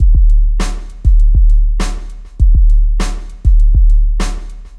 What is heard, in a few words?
drum bass